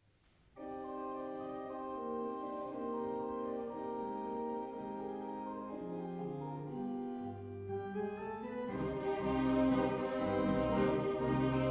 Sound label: Tango; Orgelschaetze; Twistringen